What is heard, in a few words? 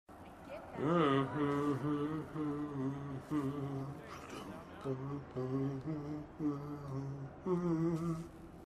Humming; Cayde-6